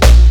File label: BLAMP; E-MU Formula 4000 Series Vol. 1 - Hip Hop Nation; Wicked Hits